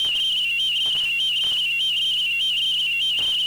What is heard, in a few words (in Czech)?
zvuk 3kHz